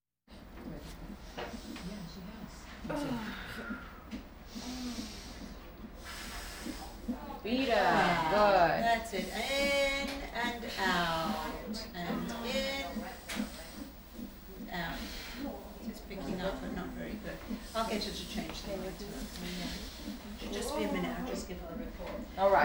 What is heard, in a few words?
Hospital effects